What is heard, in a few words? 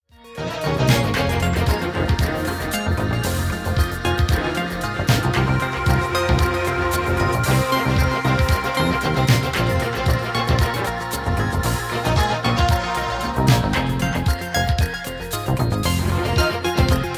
Arpegiator